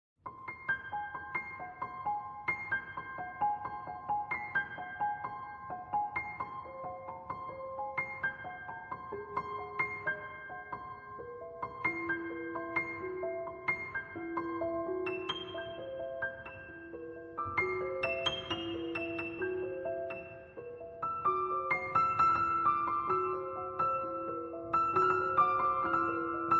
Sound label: prepared piano